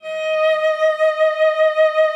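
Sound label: D#5, cello vibrato; Xsample VOL-7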